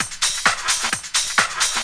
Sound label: drt 130 addon, DRUMLOOPS, LOOP DANCE; Ueberschall; Techno Trance Essentials; ADDON1